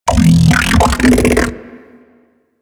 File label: Ambient Vocal; Nechro Mech